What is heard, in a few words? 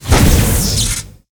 skill explo